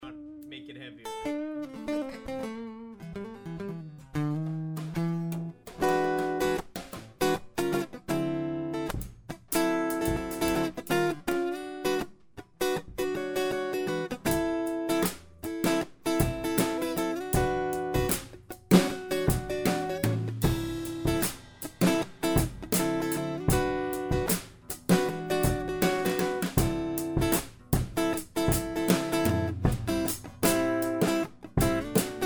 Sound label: funk jam